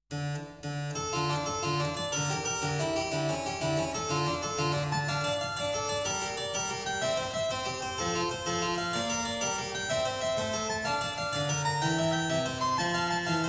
Prelude in D minor, clavier; Prelude & Fugue No.06; BWV 851; BACH; WELL TEMPERED CLAVIER; BOOK I

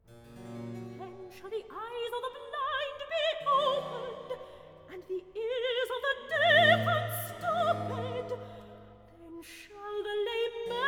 Recitative; Then shall the Eyes of the Blind be open'd; HANDEL; MESSIAH

